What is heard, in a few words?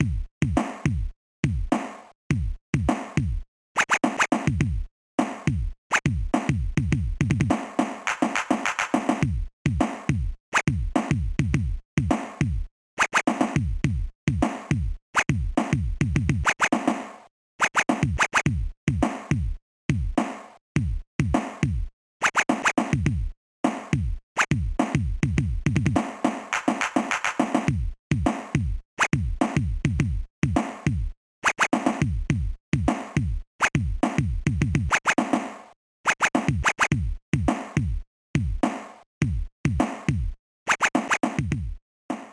Elevator Beats; ToeJam and Earl